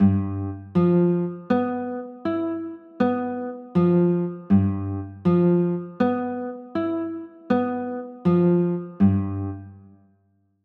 g 13, chords

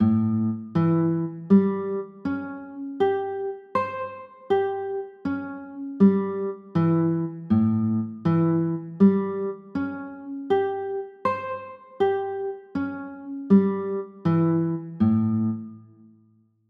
a sharp 6 9, chords

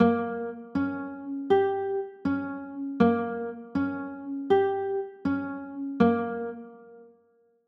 g dim, chords

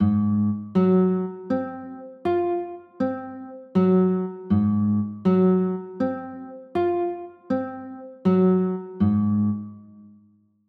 a flat 6

